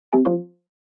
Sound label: unmute delay